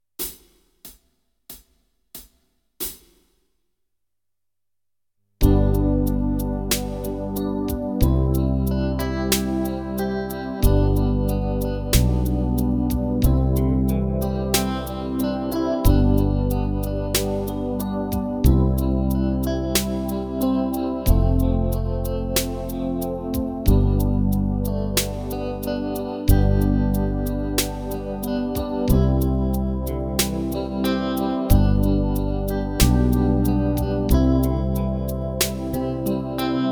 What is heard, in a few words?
Backing track, keyboard; Kenneth Baker - The complete keyboard player